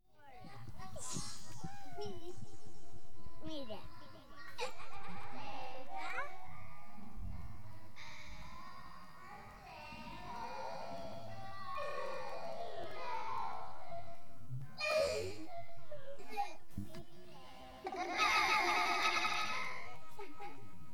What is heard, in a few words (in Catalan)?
Nenas Riure